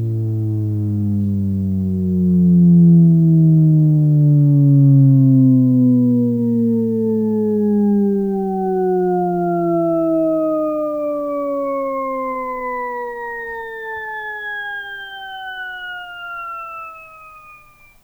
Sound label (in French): Son Castellengo Risset, sons paradoxaux